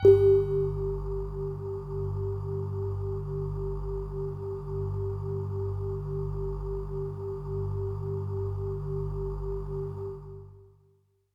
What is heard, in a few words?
Bell Air Kawaii G-1